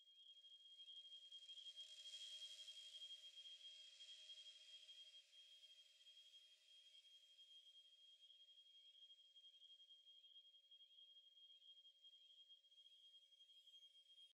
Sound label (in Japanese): 耳鳴り